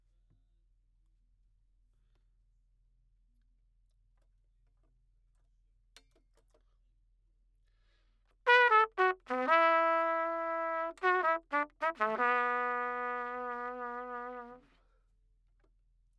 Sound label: Trumpet